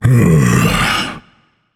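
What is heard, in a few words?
non-verbal sigh; smite; atlas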